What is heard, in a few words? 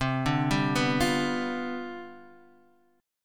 C Major 7th Suspended 2nd Suspended 4th, C, down, Guitar, Open D